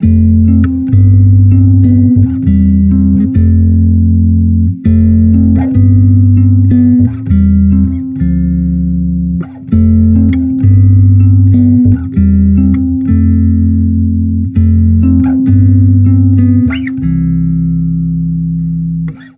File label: Amped loop 99 C, Electric Bass Loops; Slate Digital BASSHOLE Sample Pack